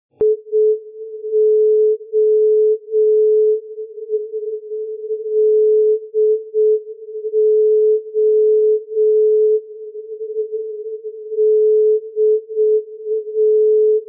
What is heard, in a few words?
0.652MHz